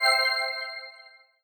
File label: create bomb; jewelpetsmatch; jewels